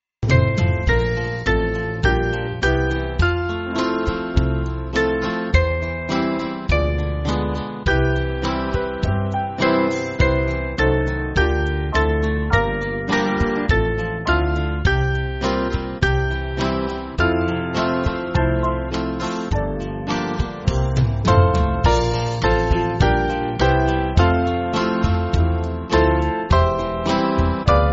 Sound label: Band, church music; The Sun Is Sinking; Irons